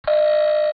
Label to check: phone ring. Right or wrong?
Right